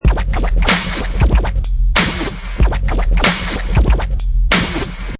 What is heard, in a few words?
Scratch 94 beat, Professional killer vinyl scratch on top of hip hop beat; Vinyl Crazy Scratch 94 bpm; timed to 94; Dj